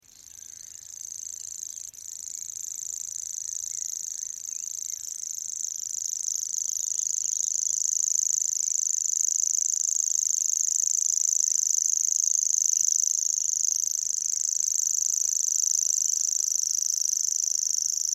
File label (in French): locust tachetee; Locustelle Tachetee, Lessive, mai 2005; une séquence de chant assez longue, elles peuvent plus courtes, séparées par un silence